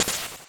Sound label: PSI Rockin; mother2